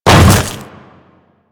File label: Hammer Boss Step Ice, footsteps; Warframe; Grineer